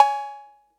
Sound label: 808 COWBELL; Concrete Collage Drum Kit; Concrete-808